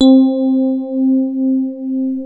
DX ROADZ SFT C3, FM Paired Tines; E-MU Producer Series Vol. 2 – More Studio Essentials; Keyboards

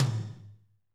TOM, Drumscapes Roland

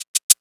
Delay Shaker; Digital Sound Factory

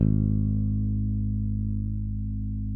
SPEC SUSTAIN, Bass

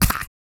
GRUNT, MALE HUMAN; Spectrasonics Vocal Planet

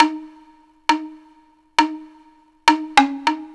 Boo Melody; Ambient; Melodic; Ambient-Melodics

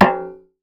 Percussive Hit, contact mic

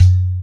Low Low Tom, E-MU Proteus-2000

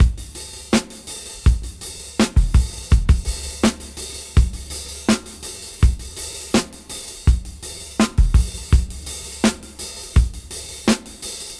Gresy 82 BPM; AMG - Keith LeBlanc Phat Butt Jams

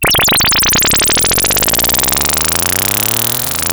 Moog F#2; Hollywood Edge; Giorgio Moroder Rare Synthesizer Collection; MLTI.MOOG

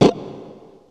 stroke, guitar; East West; Steve Stevens Guitar Samples Collection

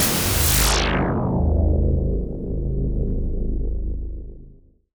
SYN, SYNTH FX; AKAI S-Series CD-ROM Sound Library VOL-6

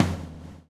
swing

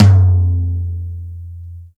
TOM, Drum Dry; East Collexion; Drum 1

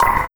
Sweep Kick; Sonic Foundry (Sony Creative Software); Esoterik Beatz; Sweep Kit

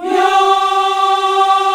G 4, CHOIR YAS, Africa; Spectrasonics; Heart of Africa 1

